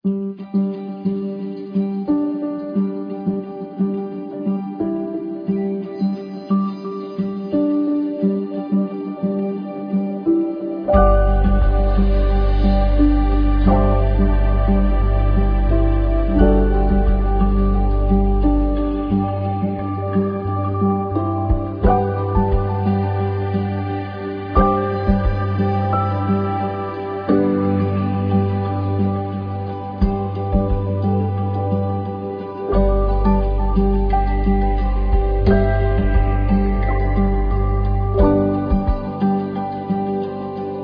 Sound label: bgmusic